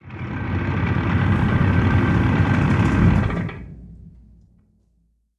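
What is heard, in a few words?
t64a engine interior stop; engines; War Thunder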